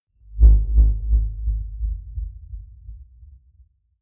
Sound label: sci-fi mission failed big